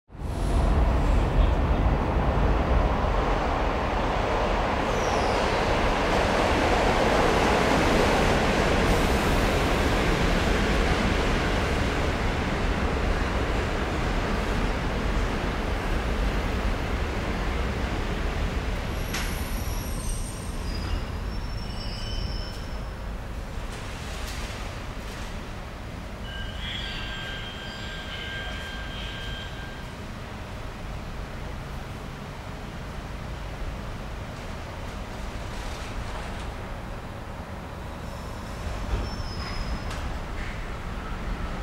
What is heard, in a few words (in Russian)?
vagonov metro